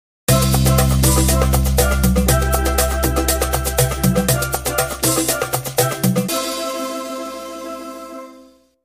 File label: calypso transition